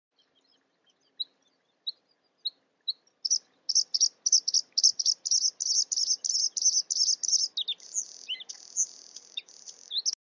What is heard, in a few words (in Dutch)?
blauwborst; lente